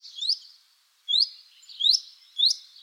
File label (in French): pouillot veloce